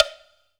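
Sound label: Lower Block, Percussion Hits; Zero-G Jungle Warfare 1, 2 & 3; 90 s Sample CDs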